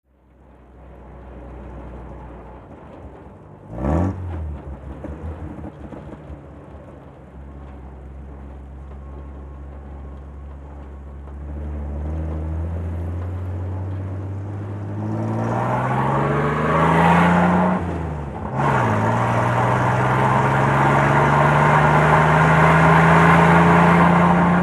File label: Dodge Power Wagon Fire Truck, Interior Pov, Accelerates Hard To Medium Fast, Coasts, Accelerates, Stops.